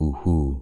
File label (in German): uhu